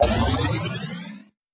multiplier up

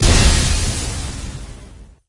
hyperspace shatter

